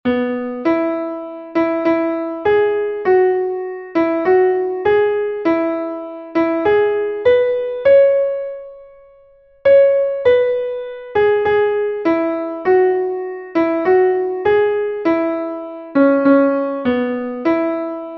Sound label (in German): klavier; nehmt abschied brueder; melodiemeister